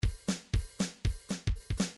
midi grooves pop

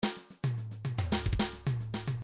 fill, midi

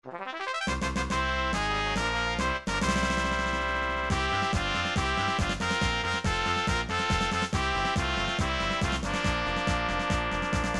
fanfare; super nintendo final fantasy ii

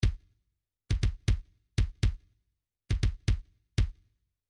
individual drums bass drum, midi

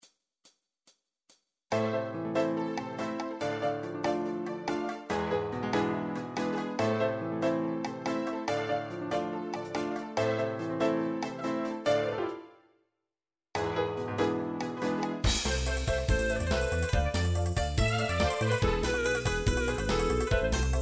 folk turkish kiuchek, midi

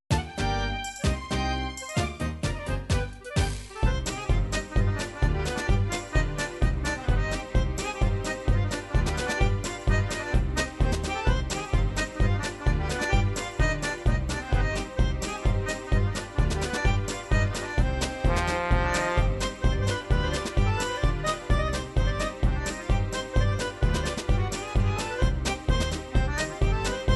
russian ukrainian polka, midi

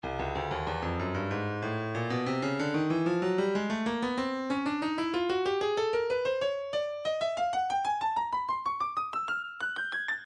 breakbeat midi, 94 bpm; innerbeat a; Stylus Breakbeat Midi Files

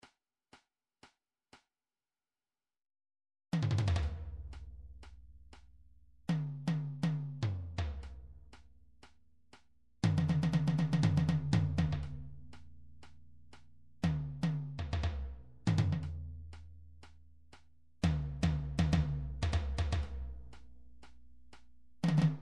drum trax tom

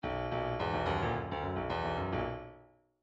midi grooves funk

